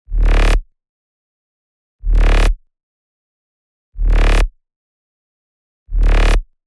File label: Seven Filter Up